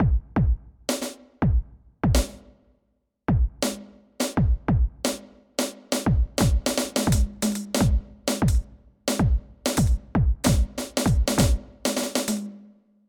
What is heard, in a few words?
korg cliphit pop